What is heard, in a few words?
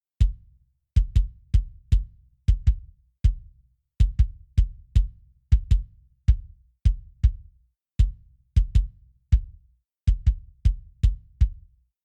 kick bass